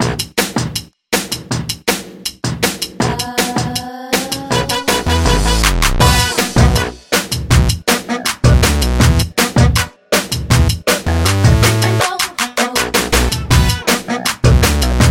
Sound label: Shuffle Ball Heel Turning Step Music